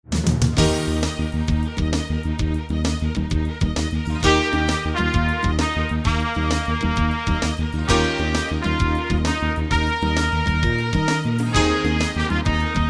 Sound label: corporate, phone on hold